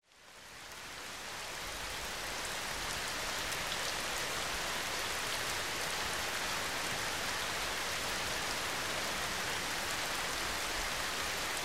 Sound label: rain; Google Ambient